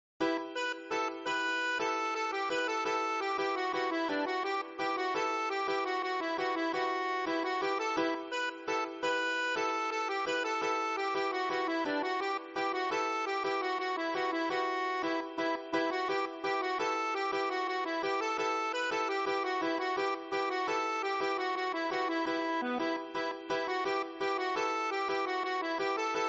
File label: Sandansko Horo, balkan